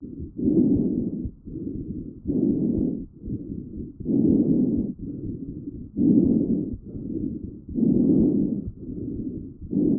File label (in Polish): Szmer pecherzykowy